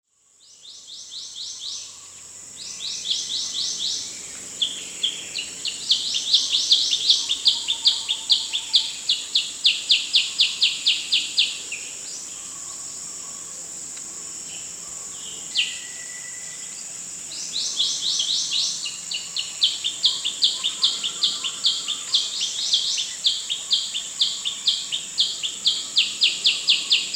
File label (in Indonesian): burung cep cep atau cucak kurincang